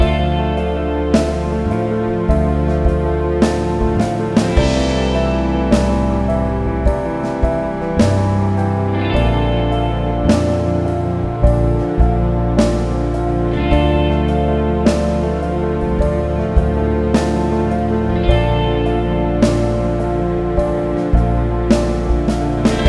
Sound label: dramatic guitar drum comp; by burak sound bank; dramatic dram vol 17